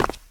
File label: concrete land; Original TK Sounds